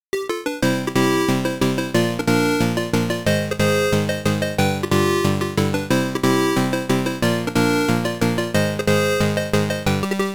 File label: unused music